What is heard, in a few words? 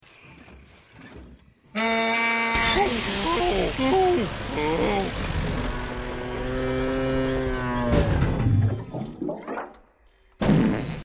tractor tippin; Cars